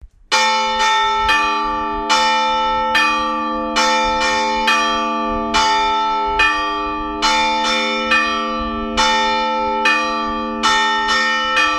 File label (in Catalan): bon temps, toc, campanar